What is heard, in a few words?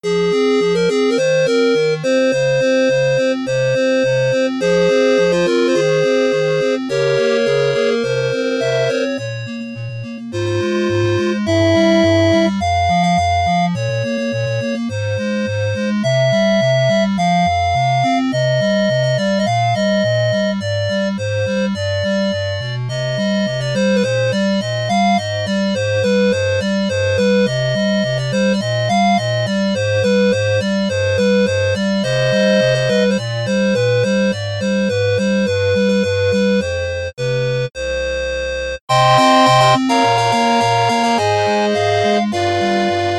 keep tryin 8bit